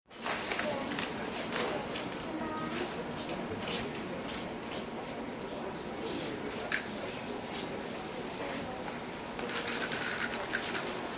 CROWD -STORES AND HALLS FABRIC SHOP: Quiet with traffic outside.